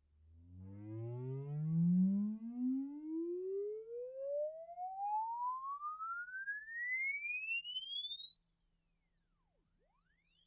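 volvo f6 tow truck onboard impulse response waves interior; dpa4021; Sonniss GDC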